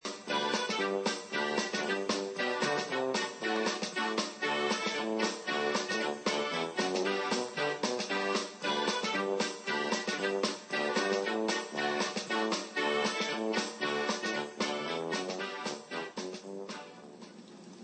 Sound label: polka; geek